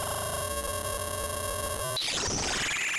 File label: pop, Sound of a cat meowing